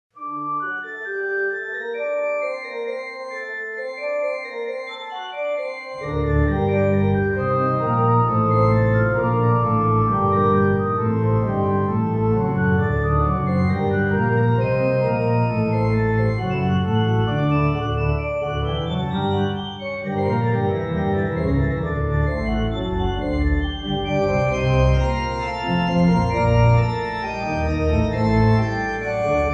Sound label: Praeludium for Organ; Hommage to Bach